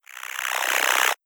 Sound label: Jelly Charge